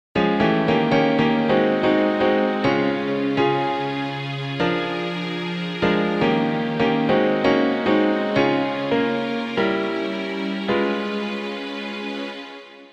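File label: instrumental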